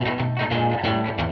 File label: 180 rocking-rhythm-guitar-down, melodic loops